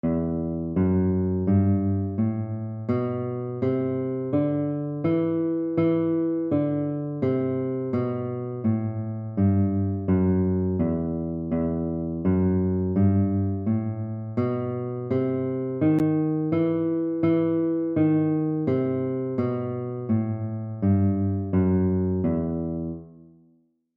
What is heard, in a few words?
E minor scale exercise